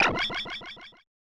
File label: Select Fanfare; Super Gussun Oyoyo; SNES